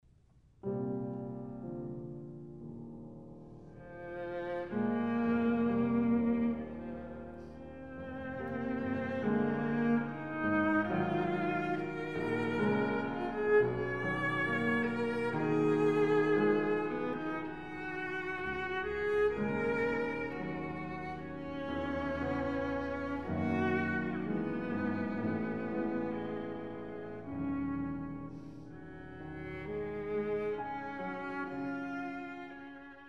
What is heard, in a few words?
elegy viola piano